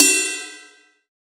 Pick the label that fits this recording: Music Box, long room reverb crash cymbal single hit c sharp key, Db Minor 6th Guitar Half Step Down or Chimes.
long room reverb crash cymbal single hit c sharp key